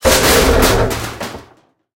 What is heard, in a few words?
metal small crash